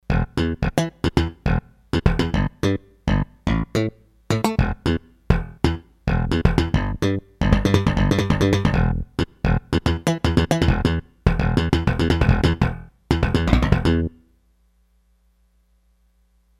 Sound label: Vari Chopper, Roland D-10; Factory Patches